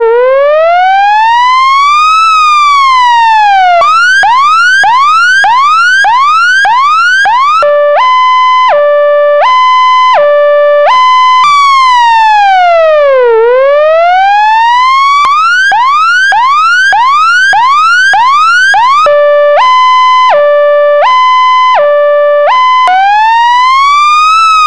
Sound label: emergency electronic siren